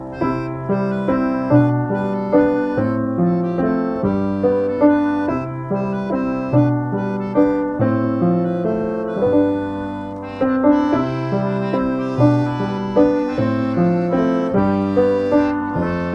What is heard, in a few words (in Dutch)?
Themalied karaoke; musical de goede bakker